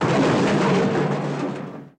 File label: Garbage Cans Tumble